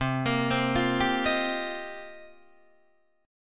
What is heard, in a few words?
C Minor 11th C Guitar DADGAD; Cm11 arpeggiated; 10,13,10,10,10,13